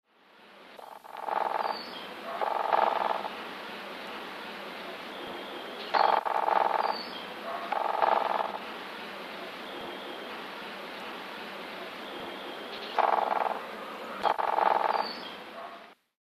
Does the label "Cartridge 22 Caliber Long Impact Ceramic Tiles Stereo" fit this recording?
No